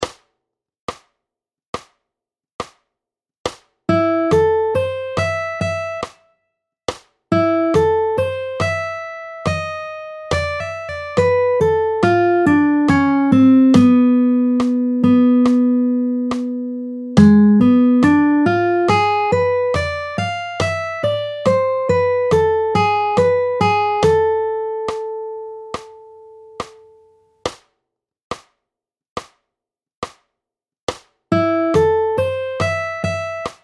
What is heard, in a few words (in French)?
Solo Impro tempo 70